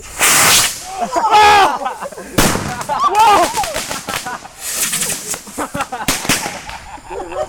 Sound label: Fireworks Toy Car